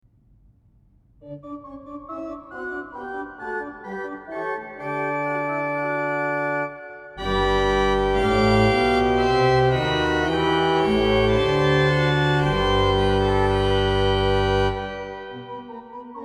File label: Ped Bd16 Gd8 Pr8 Oc4 Hw-P Hw Pr8 Oc4 Q3 Tr8; Midwolda; KB Ps 136; Oc2 Rw-Hw